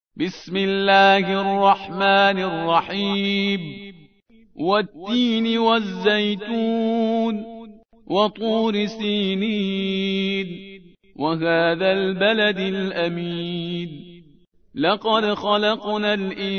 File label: Tarteel; Parhizgar; At-Tin